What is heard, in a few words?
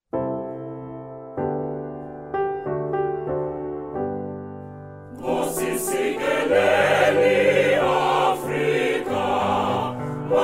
national anthem sung by choir and piano